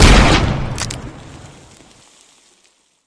pop; flood; carrier; predatorcz; halo

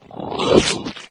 pain, flood

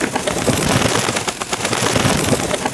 flying, weapons; serioussam2

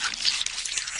infector idle; flood

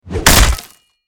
warhammer flesh

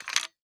m3s90 load ejectorport

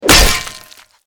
axe flesh; weapons

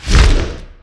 flap, dragon

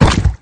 limbs, flood

